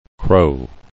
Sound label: crow